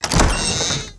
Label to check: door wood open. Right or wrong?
Right